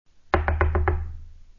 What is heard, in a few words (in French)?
battement toc toc